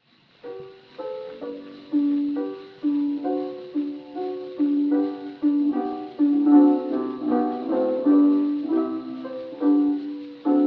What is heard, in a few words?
Gramophone